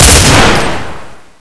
m16 burst

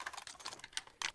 mp5 admire

